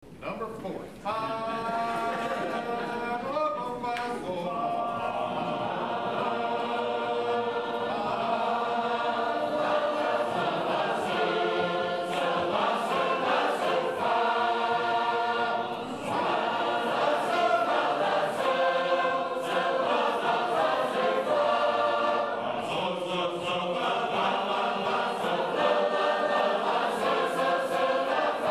Blow ye the Trumpet; Lenox

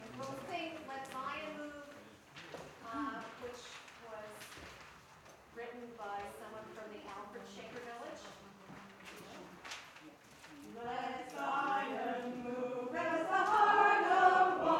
Let Zion Move (Shaker Tune)

